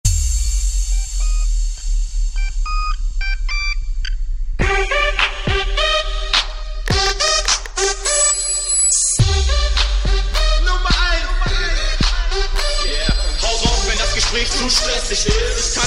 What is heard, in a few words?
EPIC remix song